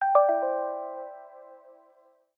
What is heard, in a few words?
Alert, ringtones; Lumia Ringtones